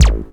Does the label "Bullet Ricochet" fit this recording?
No